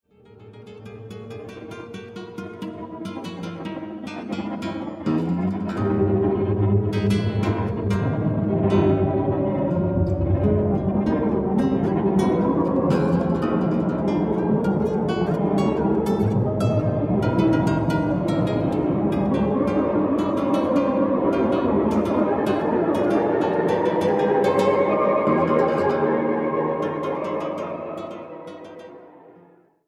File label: guitar tape